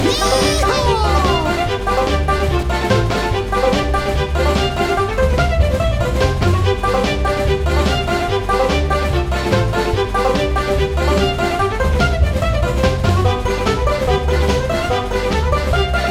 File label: race music; lego the hobbit